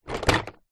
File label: leisure toy train wooden track pieces in plastic box down on surface